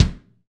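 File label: BD, kick drum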